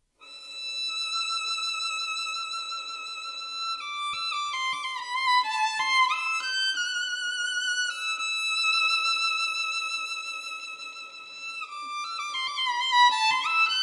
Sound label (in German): Boehmische Geige; Scherezade